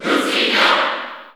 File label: Cheer English; Lucina; SSB4; SSBU